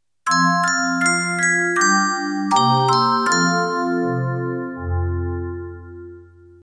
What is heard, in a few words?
bells